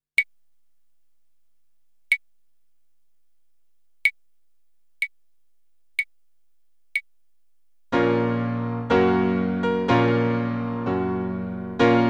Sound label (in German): Dur Moll